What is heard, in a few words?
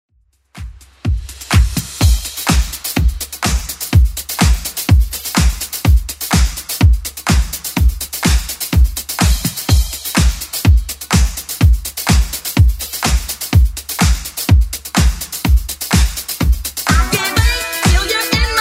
80s Club 125bpm CLEAN